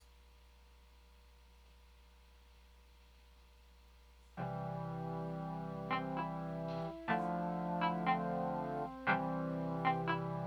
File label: lofi; beats